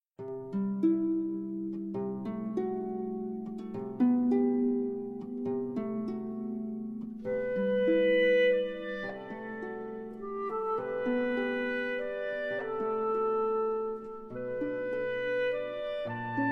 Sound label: beach, ambience music; PhoenixCraft 1.16.5